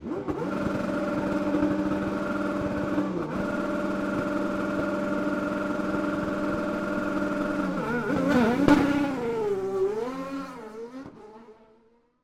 Race Car, IndyCar, Qualifying, Pit Lane, Wait at Pit Lane Exit, Rev, Intense, Away; Airborne Sound; Race Cars